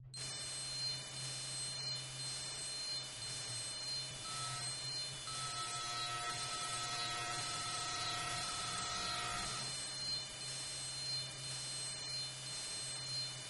ambient

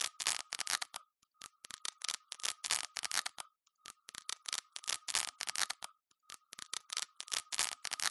dirty record skipping

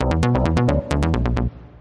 electro

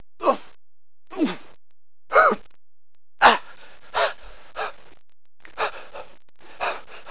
people

